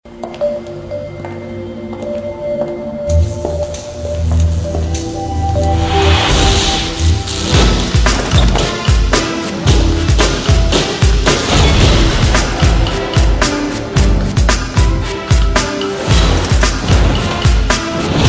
Sad Tango

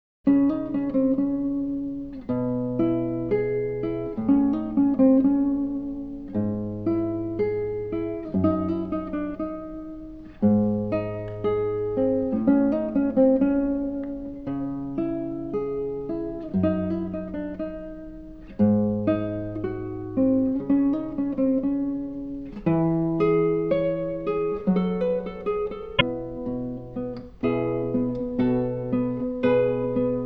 Sonata in C Sharp Minor Arranged for Guitar

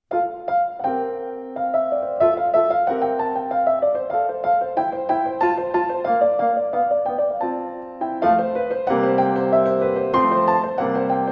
Polonaise in F piano

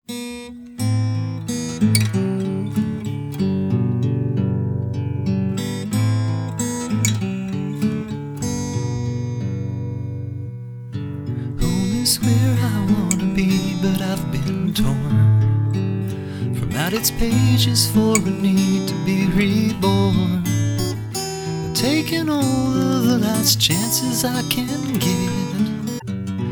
Piano; Chrono Trigger; OC ReMix

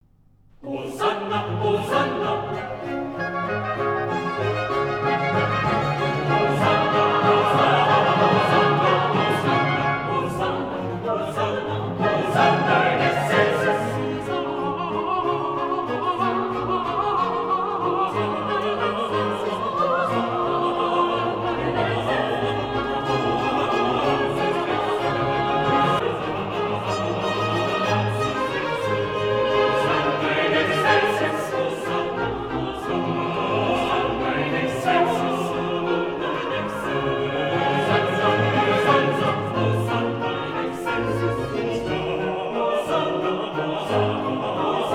Coro; Osanna; Benedictus; Agnus Dei et Dona nobis pacem; Osanna in excelsis; Coro doppio

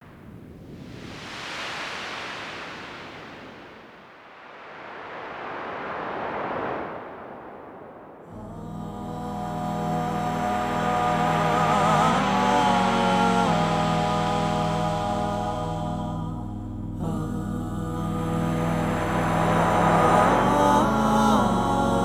Sea of Sine Waves

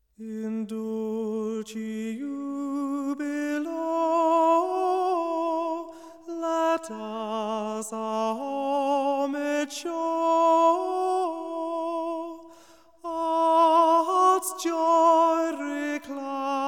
Singers Chorale prelude solo carol; In dulci jubilo; BWV608

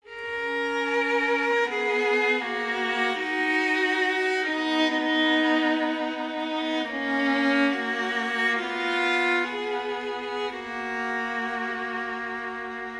Nearer My God to Thee Instrumental

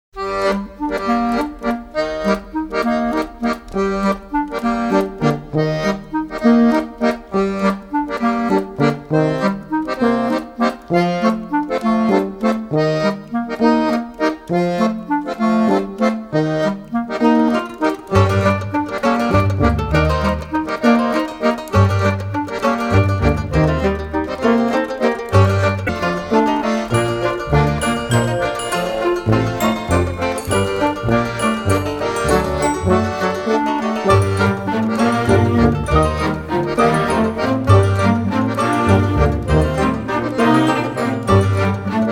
instrumental

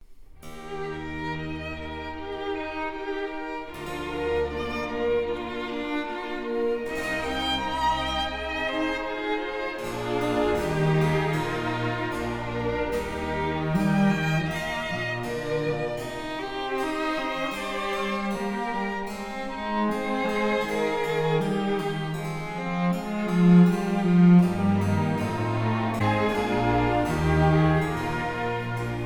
Orchestra, Concerto Grosso in G Minor

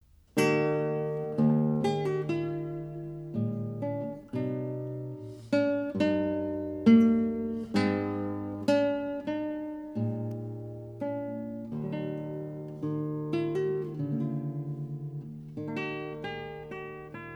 Guitar

